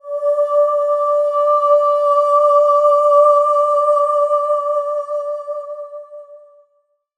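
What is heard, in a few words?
SOP X2 D5 L, soprano; Spectrasonics; Symphony of Voices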